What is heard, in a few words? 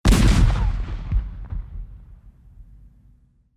civil war cannon shot with long r